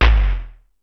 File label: More Toms, Drum Machines